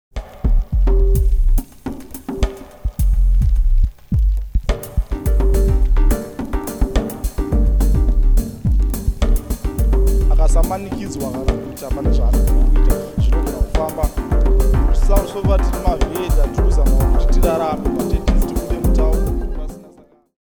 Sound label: peace dry; dense fog machine